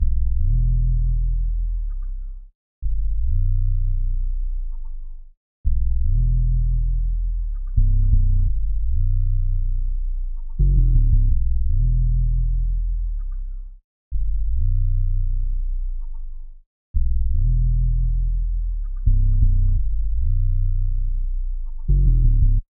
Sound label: 85 BPM B Min Bass; Loop Stems; Cymatics; Potion